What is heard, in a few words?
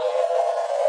drone